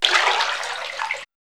SPLASH; E-Lab; X Static Goldmine 1

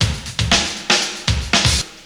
break

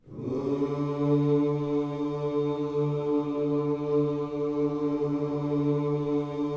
WHOO D 3, Vocal Gospel; Spectrasonics; Vocal Planet Vol.1 Gospel; akai

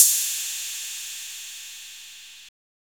808 CR

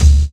BDRUM; E-Lab; X Static Goldmine 2; akai

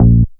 BAS TON, SOLID BAS

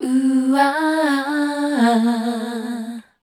Voice Spectral MELODIC C

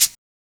SHK

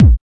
HSE-BD

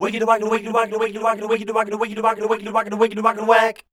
scat, voice

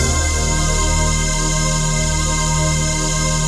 VOICE PAD, ATMO PADS; Best Service; XX Large

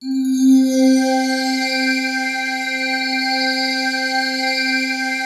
PAD, ATMO PADS; Best Service; XX Large

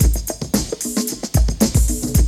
BREAK

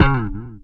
BASS FX; E-Lab; X Static Goldmine 2; akai

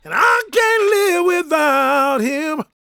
GOSP MALE, Vocal Planet Gospel

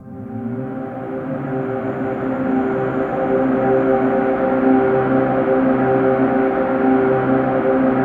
ATMOPAD; Best Service; XX Large; Pads

